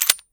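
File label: slide back, guns beretta; cof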